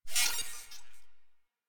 Proto Infested Mutalist Pain, Vocals; Warframe

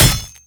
Ghoul Devourer Melee Impact

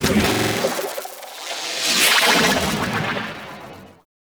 Transferrence Glitch; Gameplay; Warframe; The New War

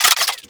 Burst Gun Reload Mech; Warframe; Archwing

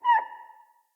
Trapped Fawn Call; Encounters; Animal Rescue

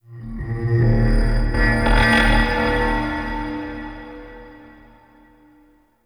Tech Texture Large; Warframe; Ambience; Cephalon Weave

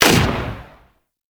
Shot, Weapons; Warframe; Tenno; Protea; Velox Prime; SMG

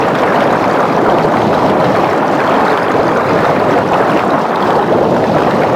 Ocean Underwater Geyser Large Loop; Warframe; Ambience; Grineer Ocean

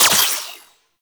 Pistol Shot; Guandao

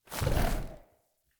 Wolf Steps Run Stop; Animals; Foley Actions

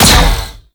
Shot, Weapons; BE Tetra

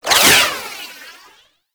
Finisher Parazon Whoosh; Warframe; Kuva Lich; Finishers

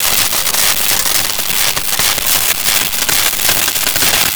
Electrocuted; Warframe

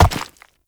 Step Rock, Movement; Uriel; Warframe

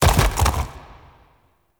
Unyielding Gallop Hooves Land; Warframe; Dagath

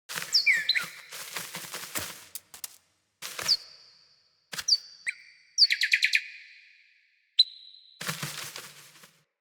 Cardinal Feeding Female; Thawtide

